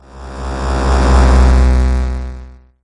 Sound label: deep swell